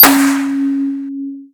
railgun fire

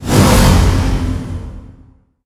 transform whoosh; gwyn

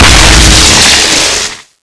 exp, thunder pistol; cso

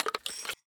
gp30 insert grenade; ins2; weapons

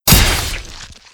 damage

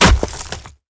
spider monster foot hit

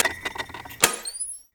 nuke arm; snds jack gmod

